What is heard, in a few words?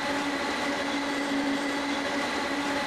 motor, Vehicles; GT6M